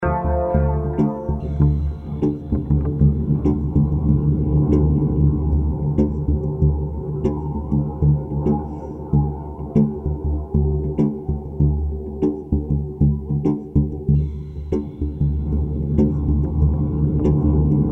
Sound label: Funky